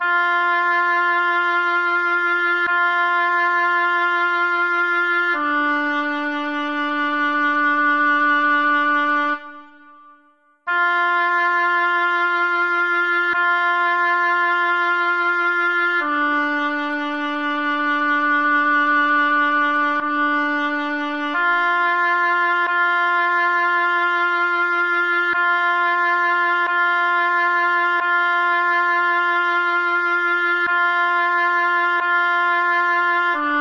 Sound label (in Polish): alt trisagion